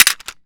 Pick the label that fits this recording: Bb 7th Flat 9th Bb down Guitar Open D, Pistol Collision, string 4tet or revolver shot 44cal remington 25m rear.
Pistol Collision